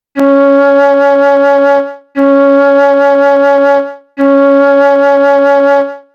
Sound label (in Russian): 3 oktava 1 do diez flejta